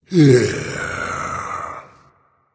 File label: content, voice; Smite; ymir skin6